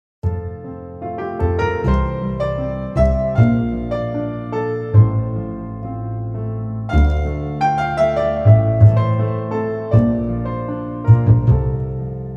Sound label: Pianoteq Pleyel + Strings